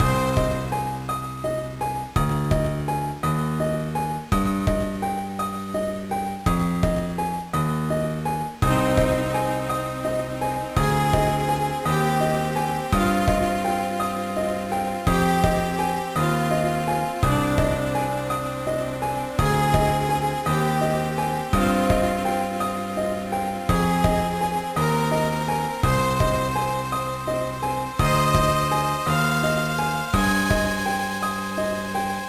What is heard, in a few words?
BGM; Ghost Trap; GBA